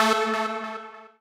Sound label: lead a3